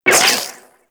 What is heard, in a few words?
Shot, Auto Cross Bow; Warframe; Weapons; Tenno; Prime Ballistica